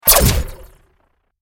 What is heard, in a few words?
destroy super